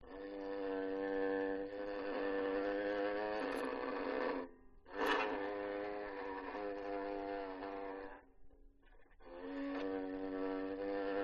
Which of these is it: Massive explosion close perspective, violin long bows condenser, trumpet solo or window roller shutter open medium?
violin long bows condenser